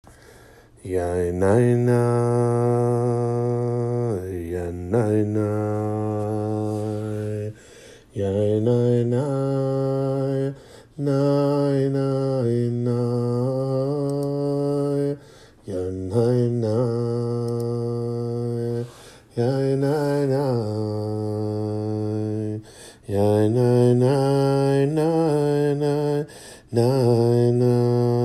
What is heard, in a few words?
Shochen Ad Niggun